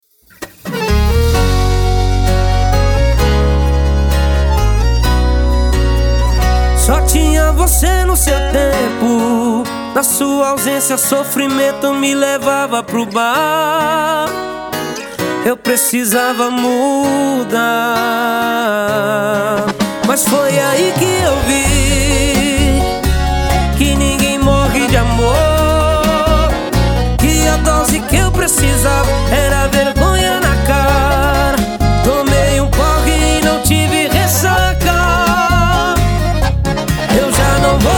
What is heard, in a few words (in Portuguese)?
sertanejo sofrência